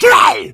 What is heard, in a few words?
hunter shred, attack voice; player; source engine; left 4 dead 2